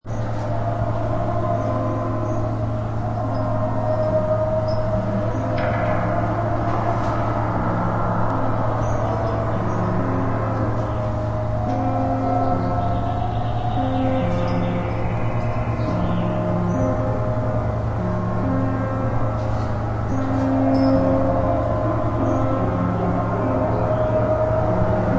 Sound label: electronic rhythm